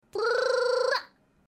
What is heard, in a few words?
purr